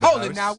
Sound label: Vox